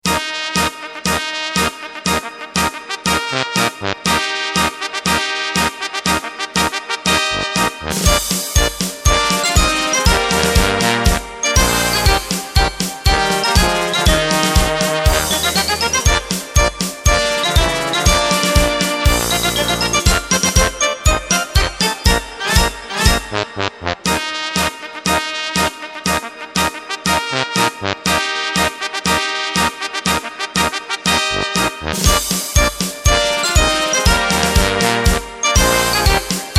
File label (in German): Marsch